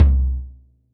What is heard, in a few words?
bass drum, gretsch kit